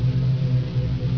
HUM, 机器

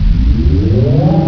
EDOOR; 机器

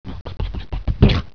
PLANE COF, 碰撞声音素材

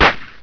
CRACKER, 爆炸

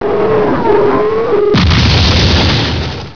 BOMB, 爆炸系列声音素材

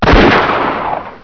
RIFLE

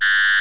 LASER; 激光

